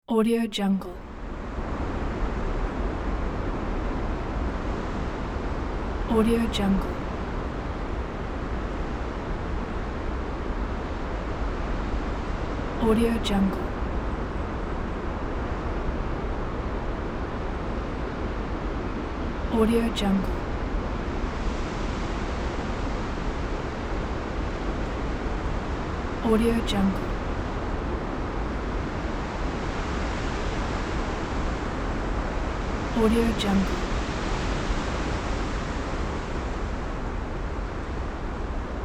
strong wind

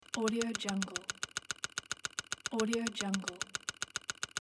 slot machine spin loop

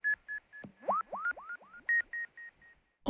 electronic beeps alien birds chirps; prosoundfx

